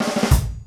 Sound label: Kick Snare, Drum Machines